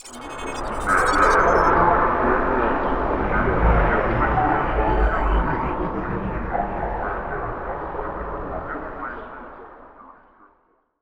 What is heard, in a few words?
sfx event announcement; Stellaris; federations